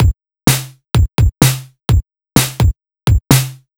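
bass drum snare, Drum Loops 127bpm, Nu Dance Electro; Zero-G; Soundclash Nu Dance Electro; Acidized Wavs; Minipops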